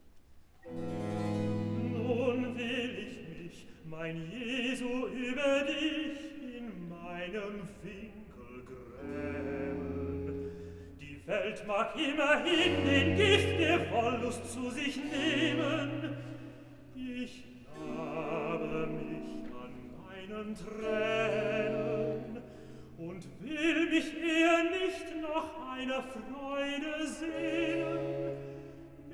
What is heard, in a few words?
Recitativo (Tenor); Bach; Cantata, BWV 159 'Sehet! Wir gehn hinauf gen Jerusalem'; Cambridge; Quinquagesima